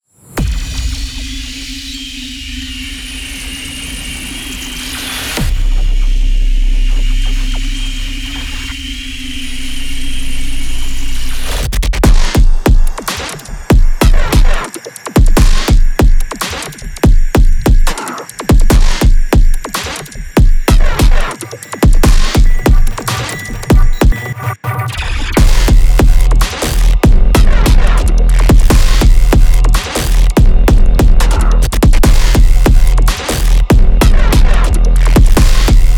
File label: Deconstructed Sounds, グリッチ系サンプルパック; ethereal2080 Deconstructed Sounds